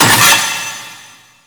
Vaults Stake Gate; Warframe; Entrati

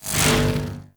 Hoverboard Slide Start C; Vehicles; Warframe; Venus Hoverboard

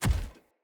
Corrupted Battle Dog Walk Default Step, Footsteps; Animals; Foley

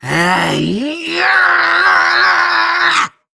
Scream; Warframe; Grineer Catbrow Handler; Battle Barks

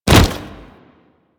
Generic Grab; Warframe; Characters; Tenno

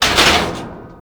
Military Checkpoint Metal Hit

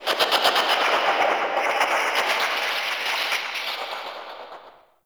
Crackly Birds; Ambience; Warframe; Shadowgrapher

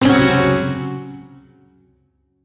Shawzin Hirajoshi Chord; Warframe; Duviri

